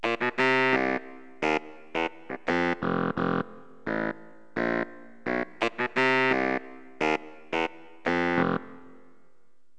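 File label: baritone sax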